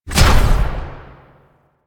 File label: Fire; Warframe; Tenno; Grimoire